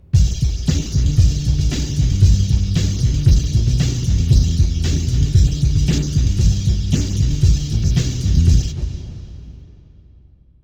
Ditty

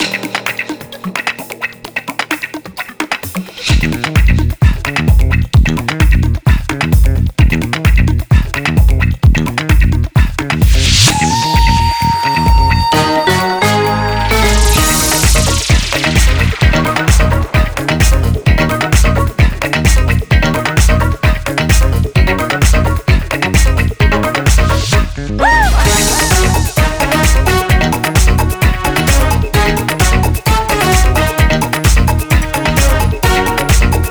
Funk 130bpm

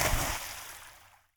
Splash

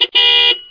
car; misao

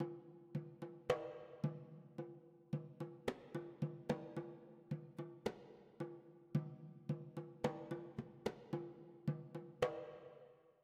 Drums Mid; Frozen Flame; windows

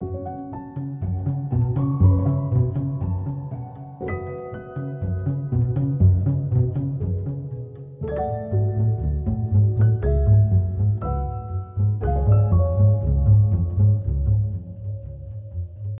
Tutorial Calm

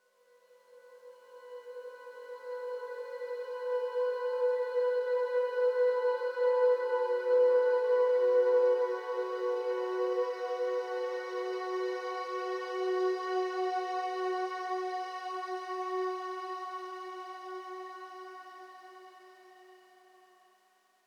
Crystal SOS tone Klang; inside

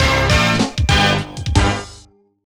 Strategy Level Up; big money deluxe windows gamerip 2002

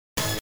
pickup tone

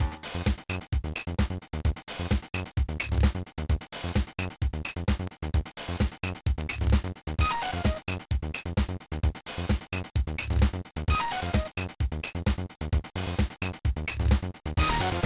Fight; Downing Street Fighter

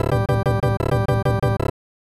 Win Animation; Super Double Yakuman; SNES

